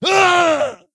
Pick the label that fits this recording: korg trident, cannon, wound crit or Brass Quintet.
wound crit